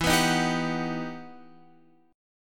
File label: E Minor Major 9th, down Guitar Open F; EmM9; strummed; JGuitar